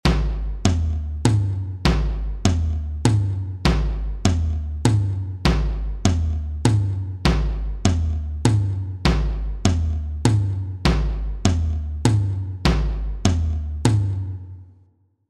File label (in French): main 100 bpm